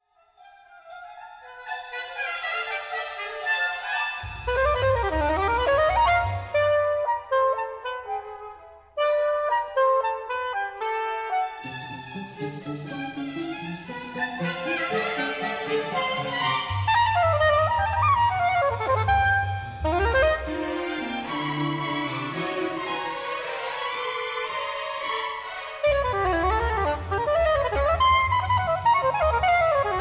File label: saxophone concerto quirky impetuous